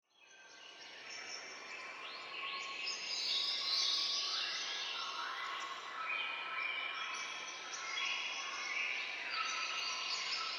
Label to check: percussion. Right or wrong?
Wrong